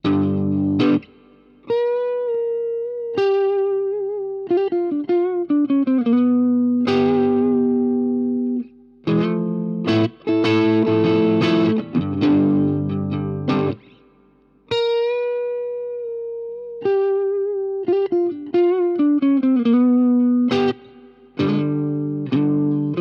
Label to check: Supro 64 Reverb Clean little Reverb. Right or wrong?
Right